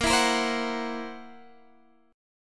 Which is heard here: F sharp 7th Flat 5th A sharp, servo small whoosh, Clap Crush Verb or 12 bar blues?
F sharp 7th Flat 5th A sharp